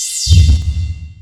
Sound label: Sniper Skill; WarRock